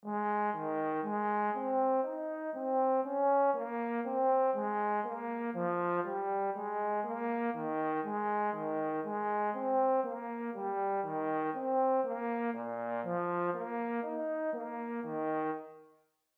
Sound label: Tenor Clef Example